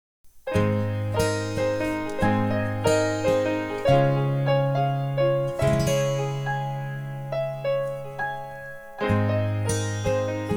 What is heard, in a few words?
Laughter Sample